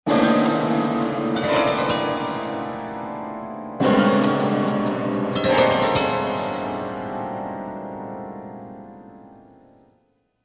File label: Creepy Piano